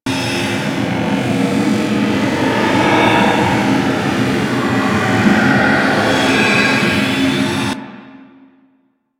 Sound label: Gas Pillar Loop; Ambience; Warframe; Corpus Gas City